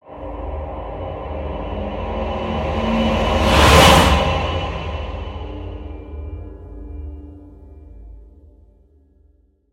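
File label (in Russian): эффект нарастания "призрачный хор"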